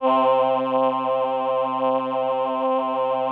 VP-330, String Machines

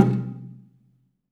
BELA C2, BELAFON, Asian Traditions; USB Soundscan vol.60; AKAI

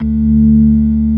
ORG. D2; B3 FULL

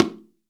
STEREO BONGO

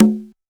Lo Conga, TR626; Drums; Hits

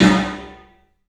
PA DEEP L, CLUB SN VRB; 3D Sound; 3D Drums

